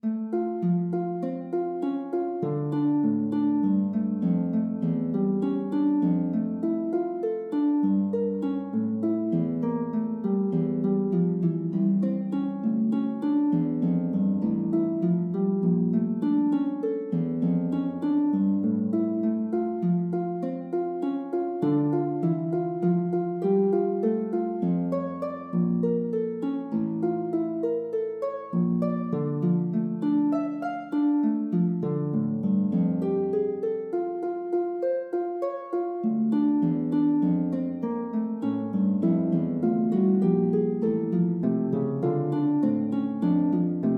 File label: Prelude, lute